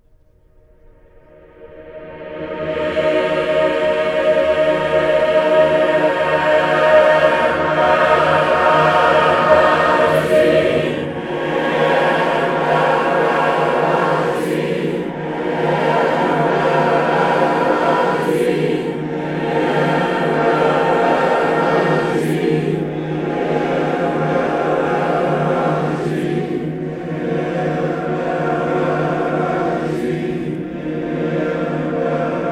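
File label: MISERERE reverse, Voices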